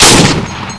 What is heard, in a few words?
machinegun